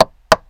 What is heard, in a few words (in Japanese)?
液体ムヒわざとらしく置く